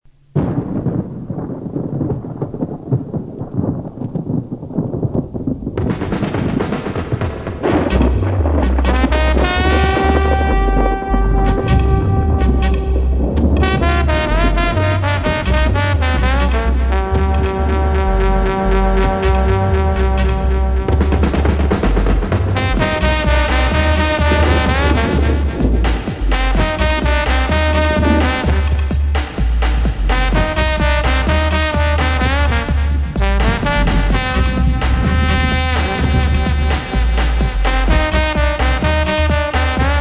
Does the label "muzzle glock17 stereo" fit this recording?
No